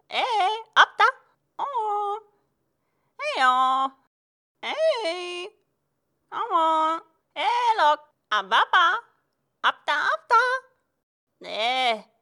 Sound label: head hit; Hollow Knight: Silksong